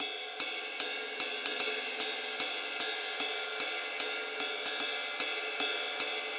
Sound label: Ride Cymbals A; регги